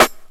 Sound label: 00s rich top end snare sample d sharp key; snare drum samples